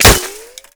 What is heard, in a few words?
impact masonry; Modern Warfare